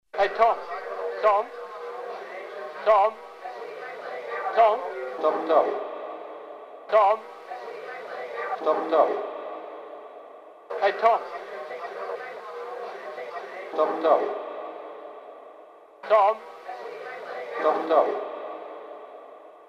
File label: tom tom tam tam